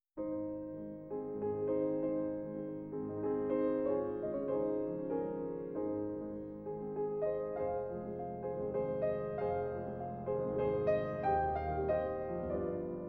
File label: Brahms waltz in A-flat major, op. 39, no. 15; YOGA